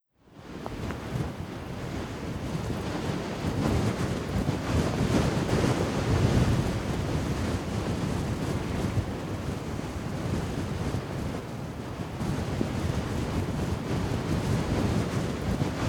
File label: Cities nyc Gusty wind